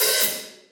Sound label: subtle reverb open hi hat sound a key, Royality free open hi hat sound tuned to the A note.